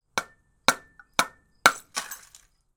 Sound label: impacts glass bottle liquid inside hit multiple times ground smash; martinimeniscus